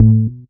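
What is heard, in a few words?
Bass Riff Bass, Loungin House; Equipped Music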